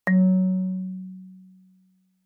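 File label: Marimba cord fortissimo Gb3; MIS Pitches - 2014; Percussion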